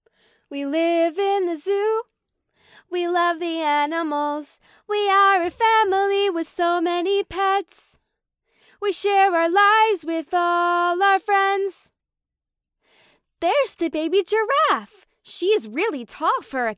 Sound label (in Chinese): 歌曲